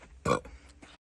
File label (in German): rulpsen